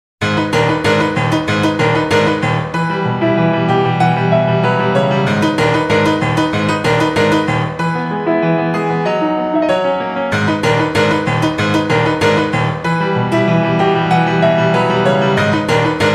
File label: (Piano); Deltarune